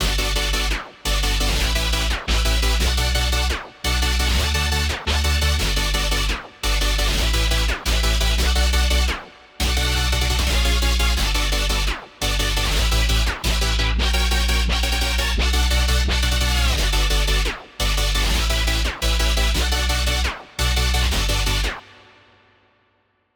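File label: lead and bass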